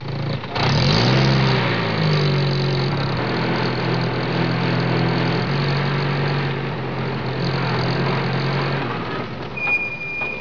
JEEP

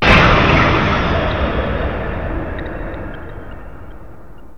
ABYSS

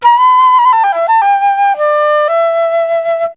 JAZZ

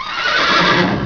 HORSE

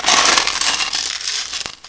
DUMP

